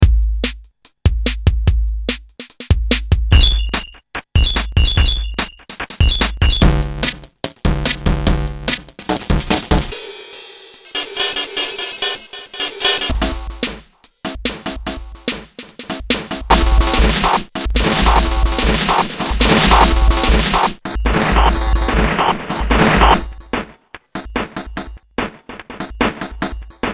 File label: SR16